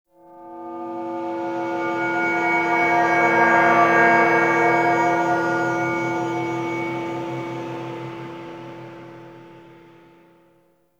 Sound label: Light Camera Pan; Jade